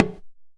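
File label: small tama hit; Best Service; World Colours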